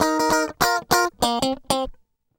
GUI, LICKS

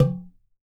oxaca air, percussion; ILIO

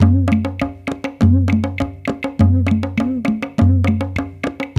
Haitian Congo, World Dance

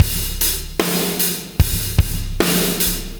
Beat Loops; Zero-G; Monster Beats

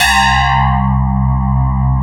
sparkles

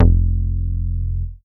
G2, BASS; Q Up Arts; Dream Experience; BASS BANK 12

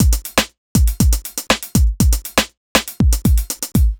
120 beat basic, Nu Jazz House; PowerFX

